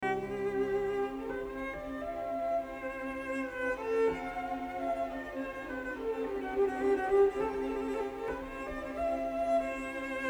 Triple Concerto for Violin, Cello and Piano in C Major